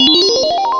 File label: GLISANDO; Zoemtonen hisses pingen woesjes tikjes